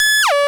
Beat

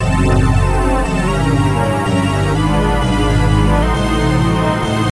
Victory; Maruku's Victory (CPU Victory Demo); Escape Kids; arcade gamerip 1991

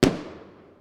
Fireworks - Pow

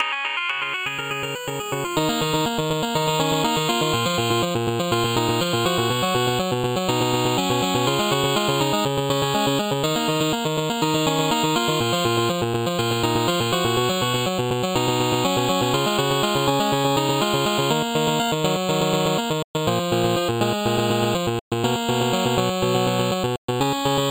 Arcade Space ship; Garlic

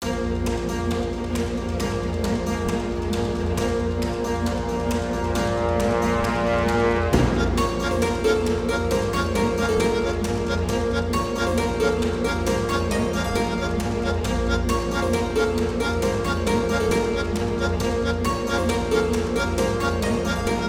Music Human Normal Battle; Bloodmasque

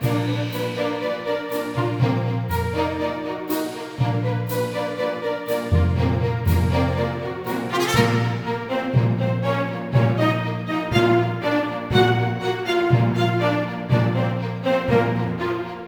BATTLE VICTORY LOOP; ravenmark scourge of estellion windows gamerip 2015

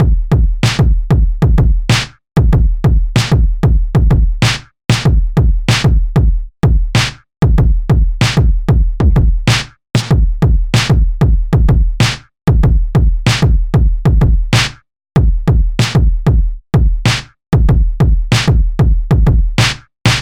Boom Chuck; super crush k.o.

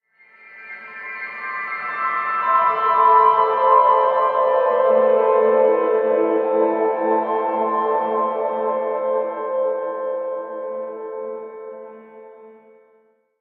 Map Piano; no man's sky

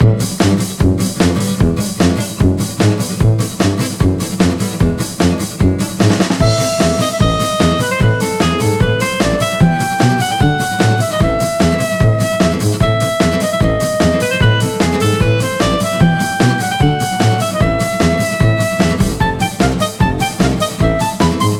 Klezmer Band; zenith

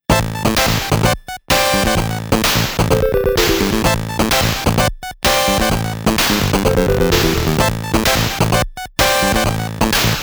NEXT LEVEL; ファイナルブラスター(PCE)

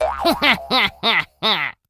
Caramel Miss Sound; Jolly Battle; Android

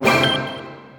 Player Turn Stinger; Mario + Rabbids Kingdom Battle

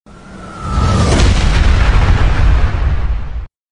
Stomp; Sfx Baphomet; Mighty Knight 2

